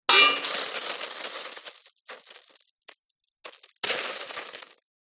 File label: foley metal hot water drips into sizzle; zapsplat